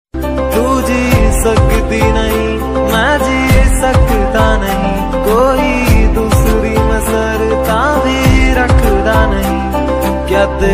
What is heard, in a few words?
romantic bollywood flute ringtone